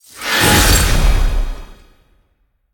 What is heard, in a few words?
explode; Smite; god Khepri